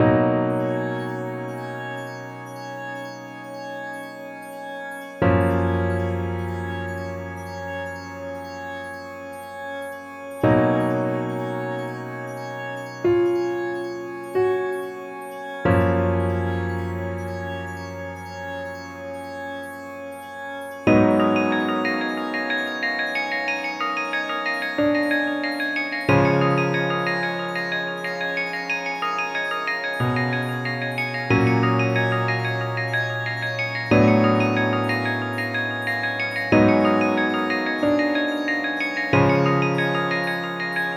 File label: bgm